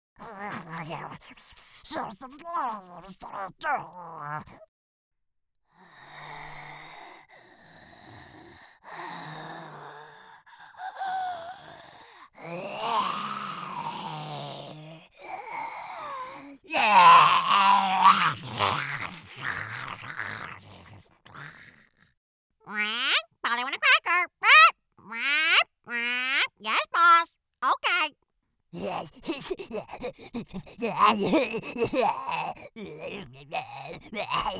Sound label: animation women creature